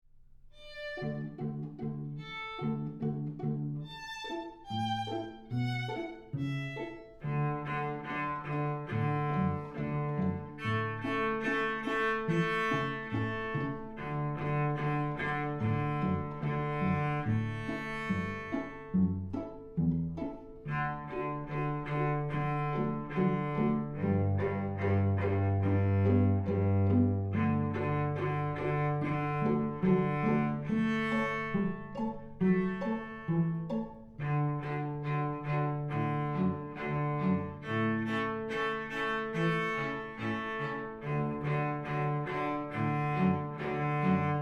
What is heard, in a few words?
Opening Up with cello